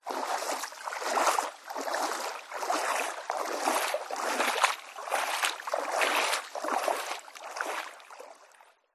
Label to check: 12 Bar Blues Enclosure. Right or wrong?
Wrong